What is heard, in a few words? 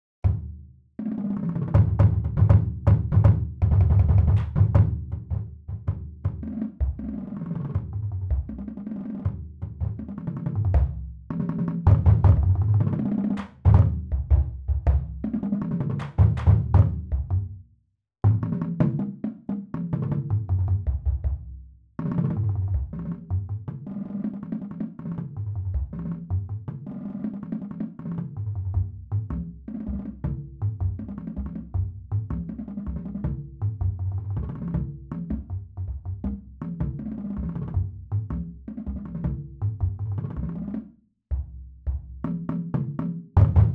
BASS